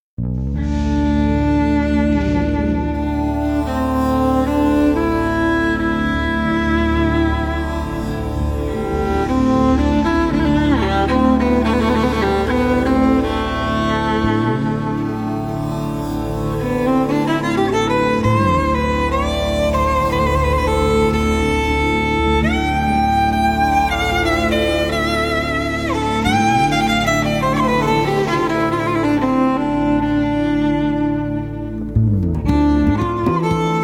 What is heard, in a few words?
rumanian freilach melody